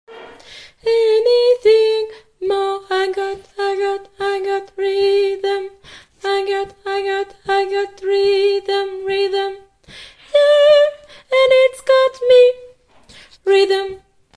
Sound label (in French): soprane